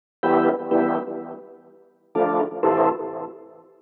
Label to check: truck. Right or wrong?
Wrong